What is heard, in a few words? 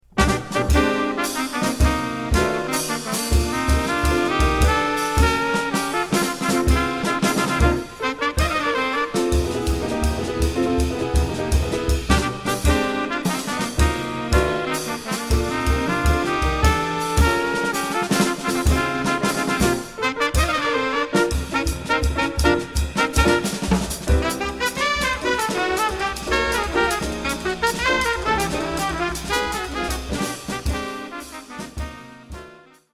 no bass